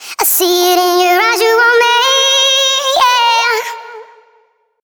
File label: Pitched 150 F